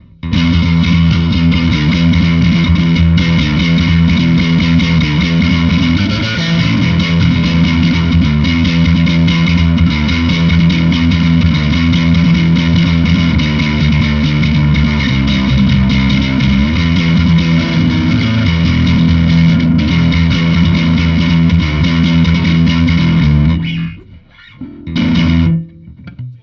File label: humbug, bass